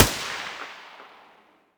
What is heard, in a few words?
muzzle M249 mono distant